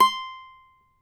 CAVAQUINHO C, Guitars

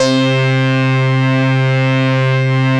700 DUET C 3, Korg; Back In Time Records; Korg Universe VOL-1

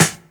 SD, Dance Drums

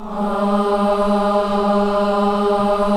KIDS CHOIR, Gospel

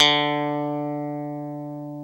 D2 Strat, clean; InVision Interactive; Lightware VOL-1; Instruments & Percussions

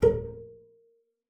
BASS PIZZ A#4; Xsample VOL-5

